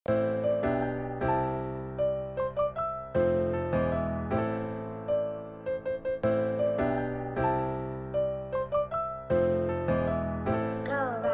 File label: instrumental